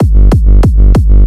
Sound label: Kick Ass Eurobass